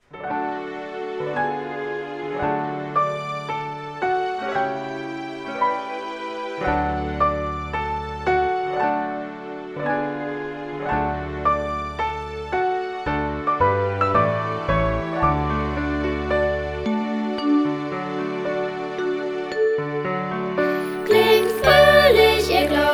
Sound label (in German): Klingt froehlich